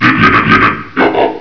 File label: alert